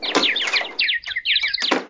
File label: Cuckoo Hit Empty; talking tom cat 2 android ios online gamerip 2011